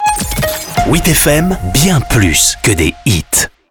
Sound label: jingle; witfm